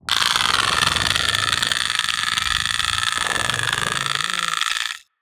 MUDRAPTOR pet Hungry

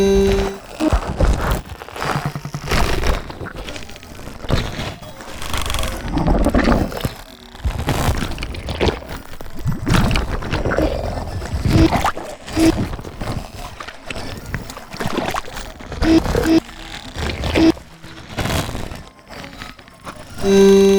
research Station Loop, Alien

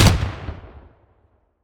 auto Shotgun; Security; WEAPONS

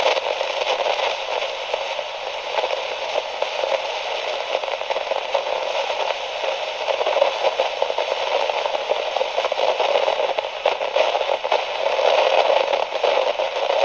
Radio Static

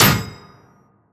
Metal Impact Heavy

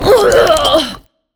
choke Female, HUMAN

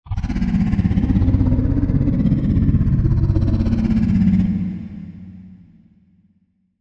ENDWORM idle; Barotrauma; Legacyendworm

